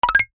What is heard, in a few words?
multimedia tone short four tone wooden